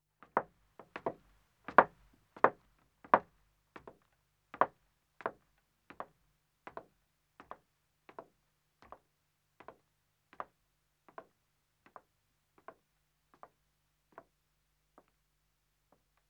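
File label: Wooden Stairs Ascending, 1 Woman, Footsteps; BBC Sound Effects Library; Footsteps II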